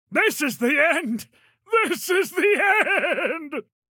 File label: town human far; Blind Drive